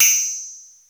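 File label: FM tambourine; Crypton - DrumBase5000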